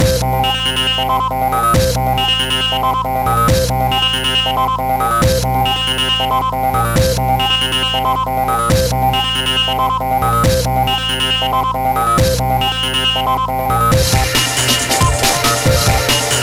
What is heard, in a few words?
tuned kick; Baz0fft